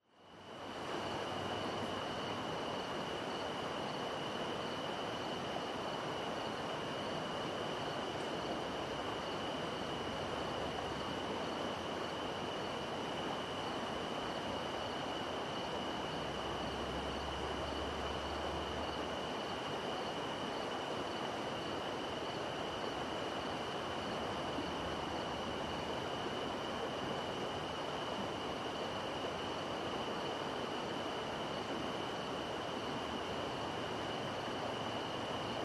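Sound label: zvuki nochi, night sounds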